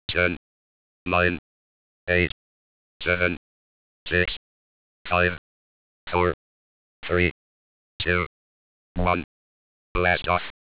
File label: blast off